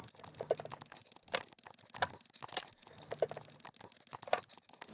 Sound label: turret turn mg light loop